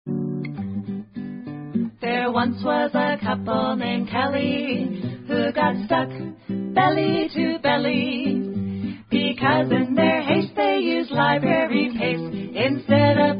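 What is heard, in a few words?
Limerick Song, Limericks without Chorus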